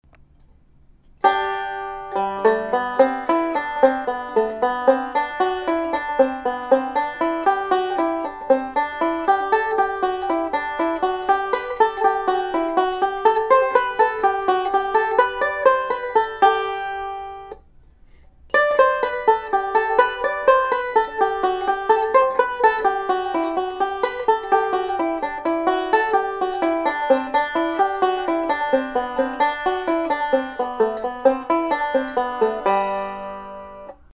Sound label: g pattern, banjo